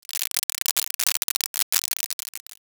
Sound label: applause